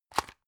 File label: drug open hard; arzt